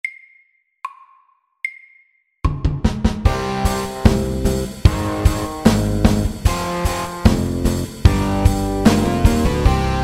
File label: Minirock SLOW 75